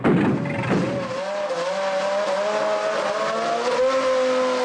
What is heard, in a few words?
Startup F1; FSR 2012